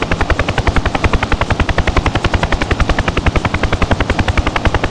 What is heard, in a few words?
Aircrafts Engines Rotor AH1W; DCS